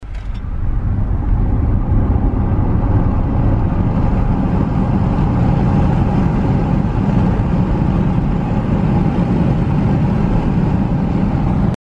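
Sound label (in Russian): audi e tron vnutri, Audi e-tron inside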